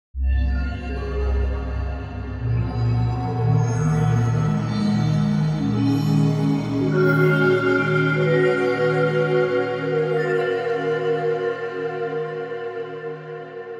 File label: ambient